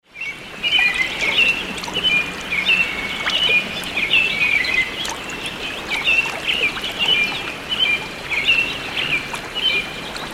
Brook Babble

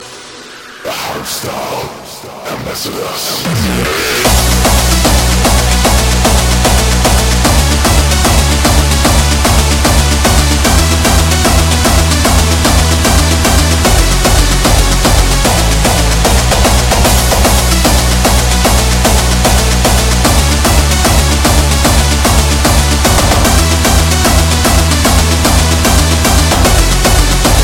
Hardstyle

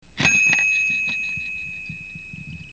3d Sound

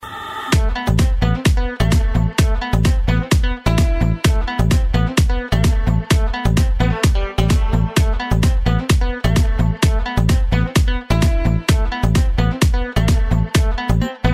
Super Nice Ring

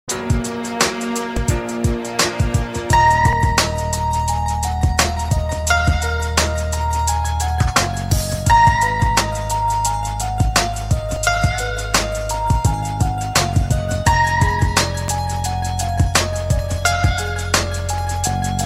Sad Piano

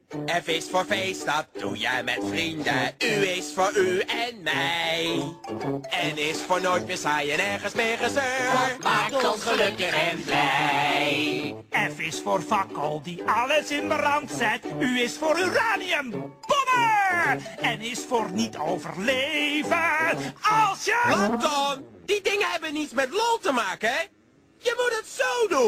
Fun Song Dutch

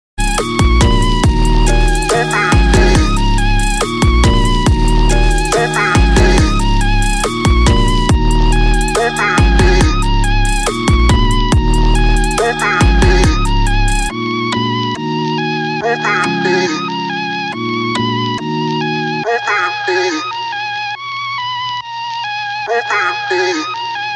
Aesthetic beat

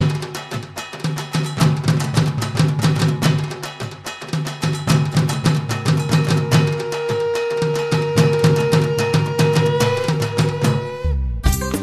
Dhak Bajbe

